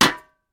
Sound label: grapple Attach Part; Kerbal Space Program; KAS